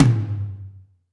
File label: Tom Hi, Alesis DM5